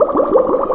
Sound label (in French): BULLES; East West - Global Sounds; SC-55 FXS